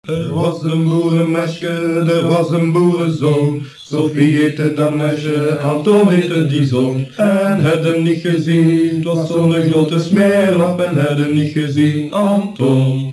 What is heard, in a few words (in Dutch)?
Lied; Lied Van Antoon; Folklore